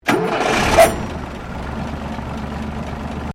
CAR HOLDEN FJ IGNITION BONET OPEN, Classic Australian cars; Holden Fj 1955